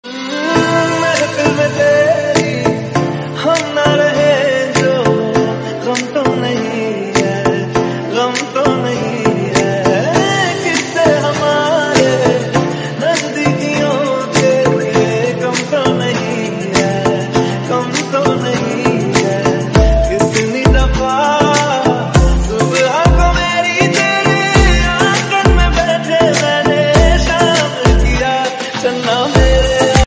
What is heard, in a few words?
REMIX RINGTONE